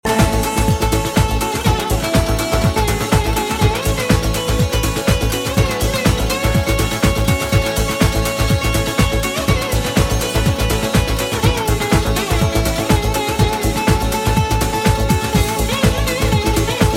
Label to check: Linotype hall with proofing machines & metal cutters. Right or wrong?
Wrong